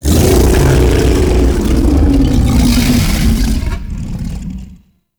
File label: Monster Injured; Warframe; Infested